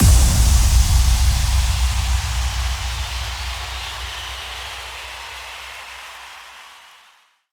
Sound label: Impact